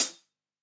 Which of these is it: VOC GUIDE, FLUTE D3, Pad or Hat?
Hat